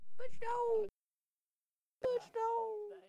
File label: bush Dog